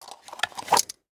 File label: magpouch gear; Benny Placeholders